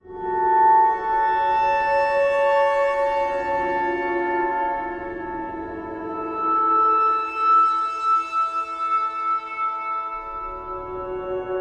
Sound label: wistful synth melody